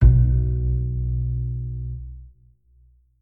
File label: Bass Note; Bendy: Lone Wolf